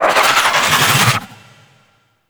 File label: Light Cast; Fairy